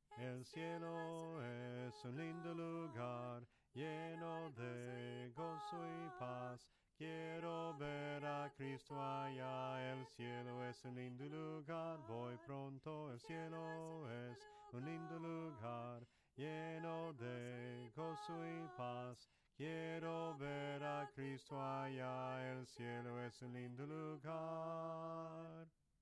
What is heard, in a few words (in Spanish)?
SOPRANO CANTADO, himnos en melodia soprano